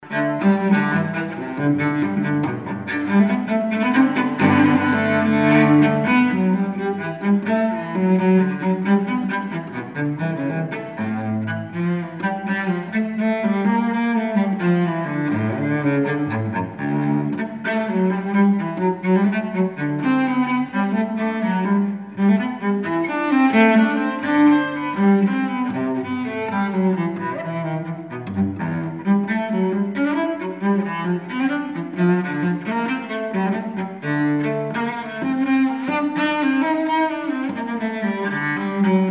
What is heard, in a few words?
French Cello Sample - Gigue; Bach Suite 1 in G